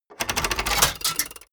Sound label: mechanics small rattle